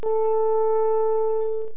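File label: french horn; synthesized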